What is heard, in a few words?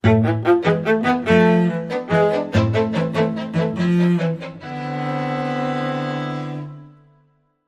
Spiccato, Sting